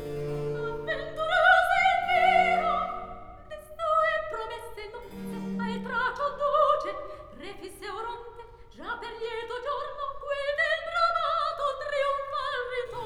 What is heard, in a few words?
Recitativo; Act One, Scene 1; Avventurosa Elmira; Floridante